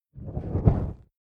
Whoosh Fireball Strange Flame Ignits Burns From Right To Left Spinning; Battle